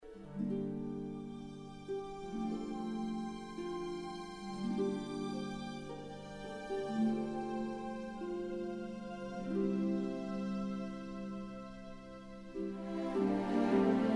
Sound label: Solo